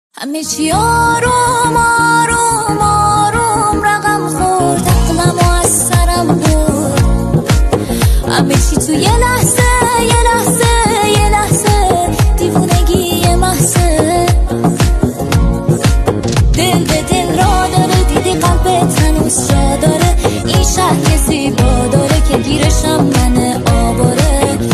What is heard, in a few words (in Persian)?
با صدای زن